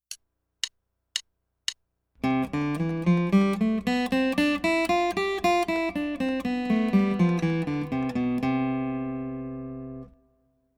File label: Scale Studies